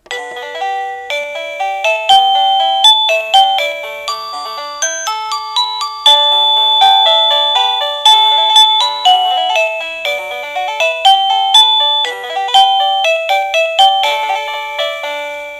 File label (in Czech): melodie